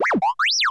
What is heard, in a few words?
astromech confirmation